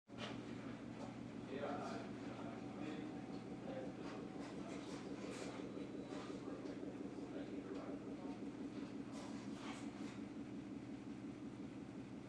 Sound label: background knock knock construction noise in the office